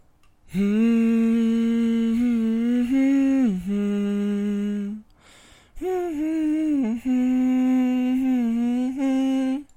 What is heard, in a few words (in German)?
mhhhhhh, Ruhige Melodie gesummt von Frau; vorgesummt